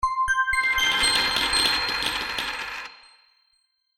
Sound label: Game Gold Treasure Bonus, Video Game Sound Effects